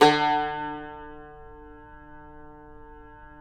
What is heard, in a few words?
CUMBUS D, Guitars; Spectrasonics; Hans Zimmer Guitars VOL-2